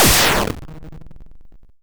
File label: exp short hard, Explosions